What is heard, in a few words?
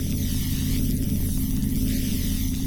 shield; firo runner